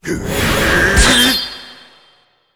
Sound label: kentauros, monster